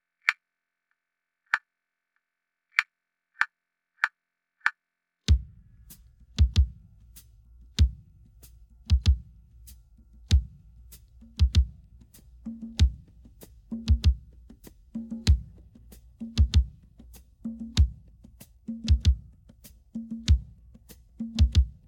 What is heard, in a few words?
pattern intro, drums